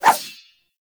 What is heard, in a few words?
Rapier Whoosh; Warframe; Weapons; Swords